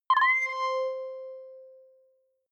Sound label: click ready boot high